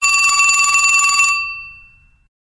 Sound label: Vintage Telephone; B470; LG